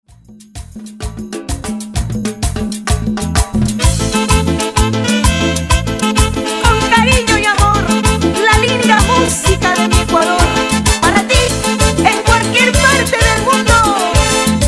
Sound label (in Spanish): Intro Chicha 6X8; R EDIT